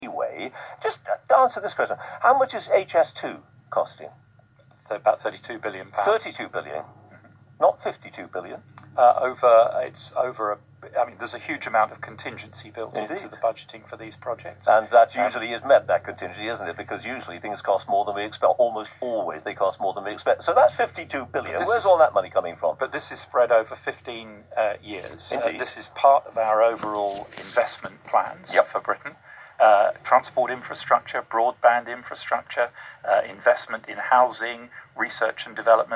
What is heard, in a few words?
hammond